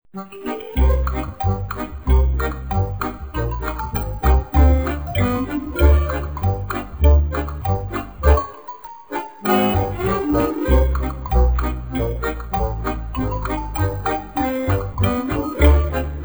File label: Radio Song; Smeshariki Museum of Communications